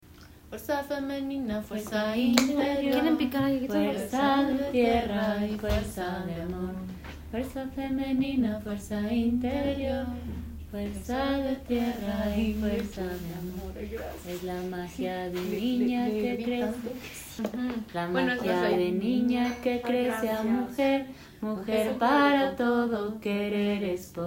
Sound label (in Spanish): fuerza femenina, canciones tambor; danza de los espiritus